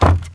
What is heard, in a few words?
Mvmt FS Jog Wood Layer; AI Stalker; Titanfall 2